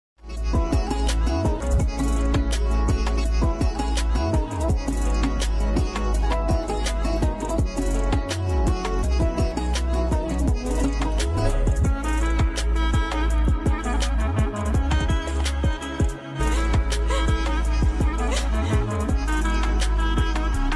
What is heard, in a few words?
ost ringtone background music; kaffara